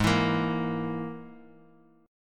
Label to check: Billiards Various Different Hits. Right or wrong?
Wrong